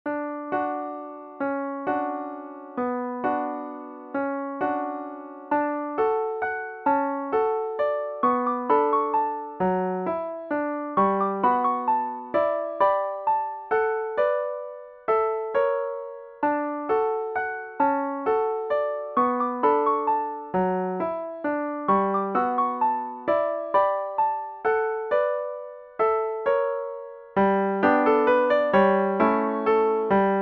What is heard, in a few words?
beyond that star piano